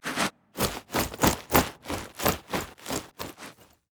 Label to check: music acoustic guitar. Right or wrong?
Wrong